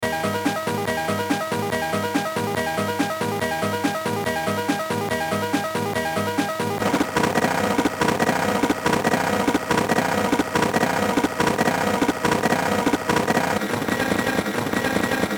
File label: wersi bending